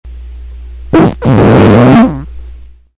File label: fart